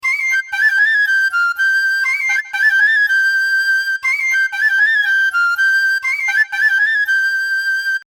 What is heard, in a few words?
lead